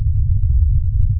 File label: Deep Ambient Rumble